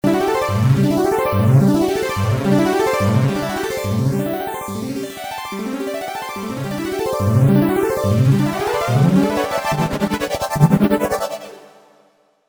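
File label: String Machine, V-Synth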